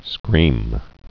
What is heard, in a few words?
scream